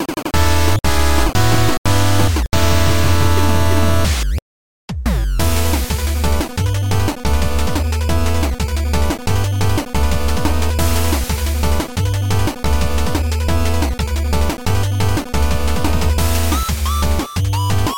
carnival battle; haniwa

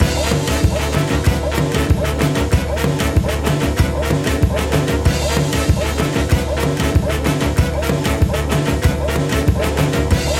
summer battle normal boss

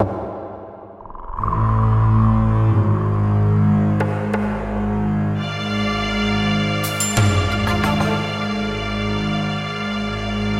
story island exploration

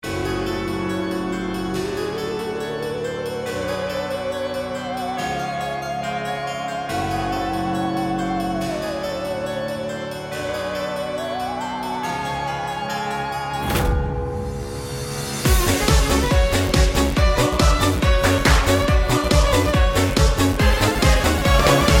halloween battle

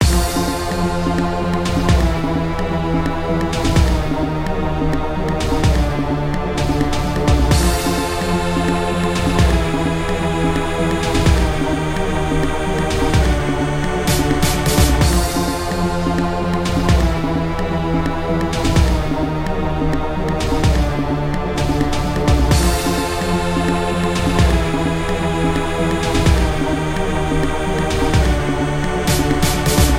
serious battle

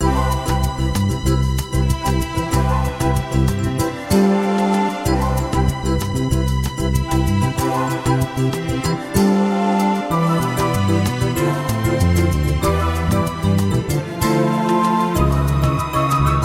story happy; valentine2022

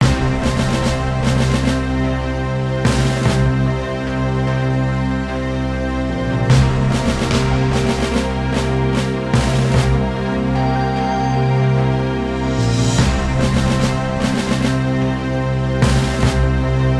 theme intro

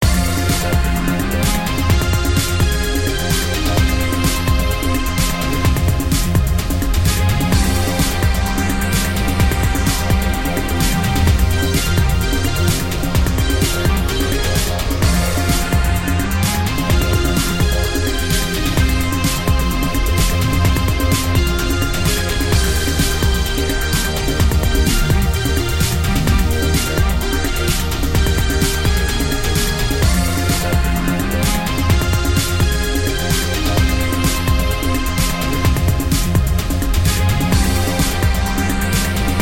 battle normal boss

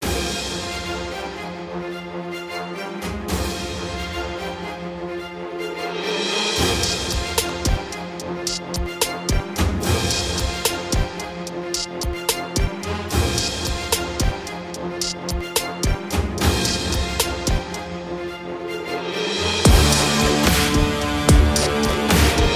battle boss; reine bleu

